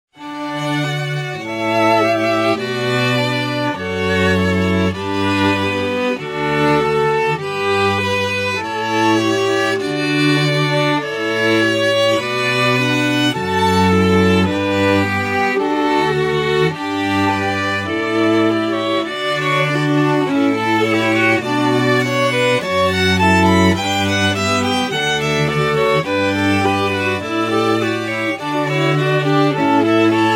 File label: strings